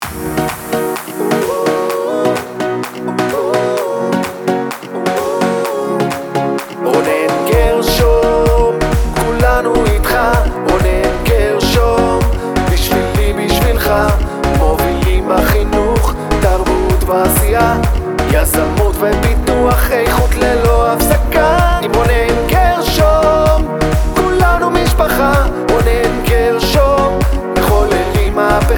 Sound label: political song musical jingle